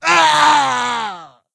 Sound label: wound crit; Creature; Skadi The Ruthless